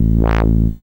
SID Bass A; CHIPSHOP HITS

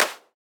Clap, techno drum

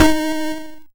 YM Synth D#; SYNTHS; CHIPSHOP DELUXE; CHIPSHOP HITS

